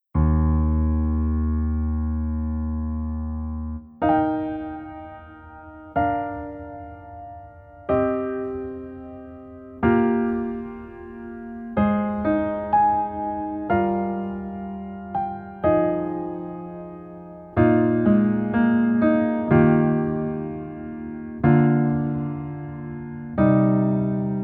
Piano ver, 남자키

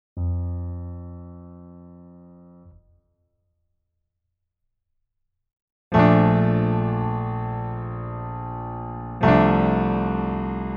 Piano ver 남자키

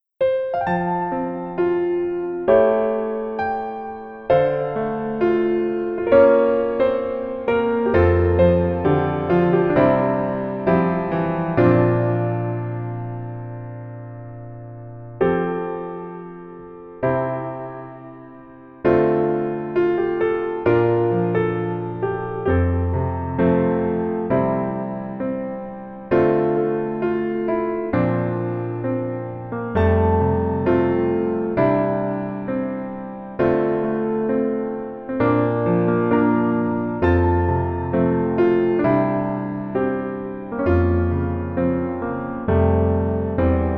Piano ver -1키

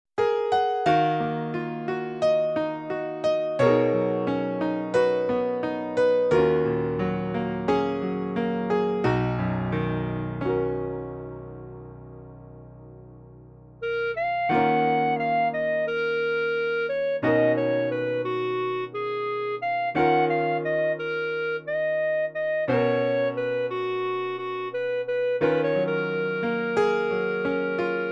Piano 멜로디MR -1키

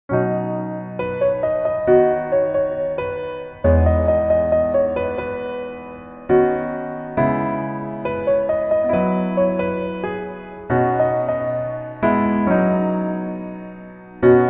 Piano ver 멜로디MR

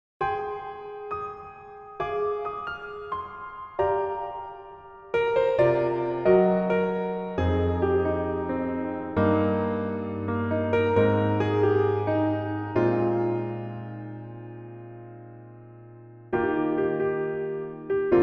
Piano MR 멜로디 MR

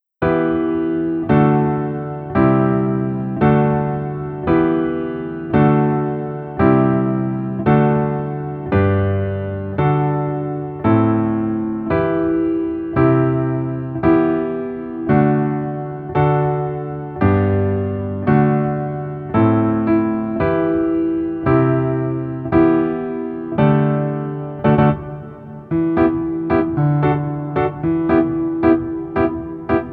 Piano ver -1 키

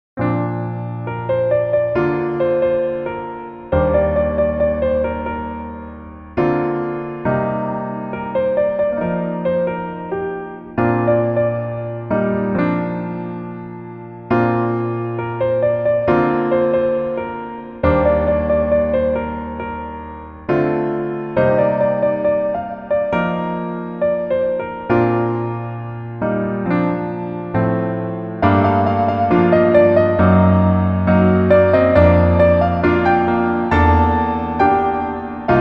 Piano ver 멜로디MR -1키

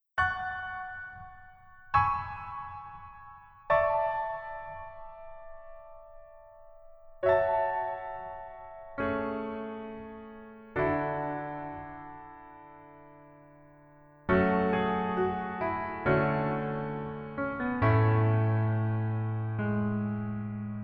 Piano ver 멜로디MR -1키

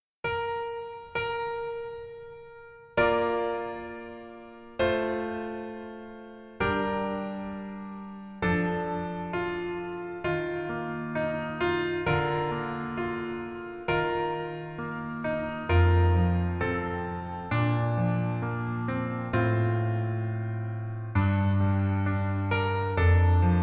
Piano ver, 여자키

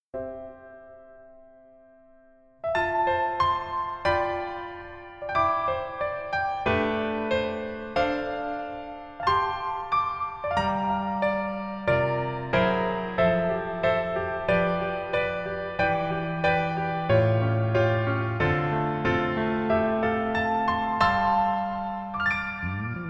Piano ver, 여자키; 더킹, 영원의 군주

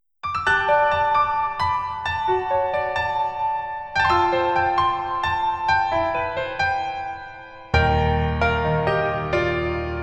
Piano ver 멜로디MR

